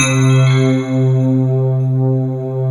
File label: SHIM PAD C2; SLIM PAD; Synth